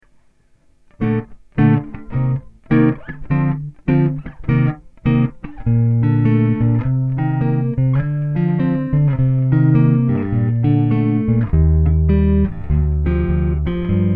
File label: ehx mb als chorus; ehx memory boy